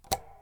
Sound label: Fan button; Phasmophobia